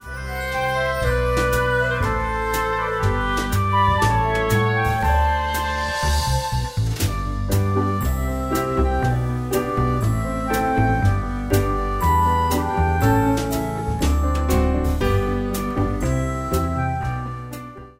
wersja instr

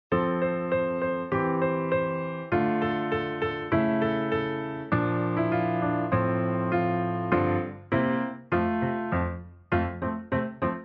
fortepian

